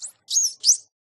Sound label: dolphin play; mob; Minecraft